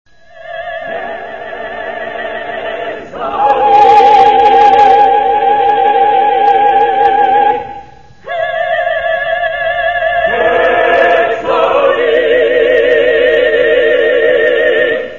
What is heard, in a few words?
Opening Music; The Lark Act 1